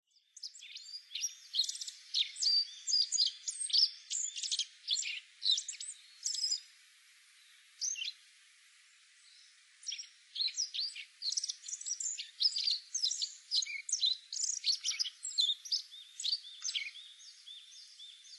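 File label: Black-faced Bunting; aoji; kunitachi; koe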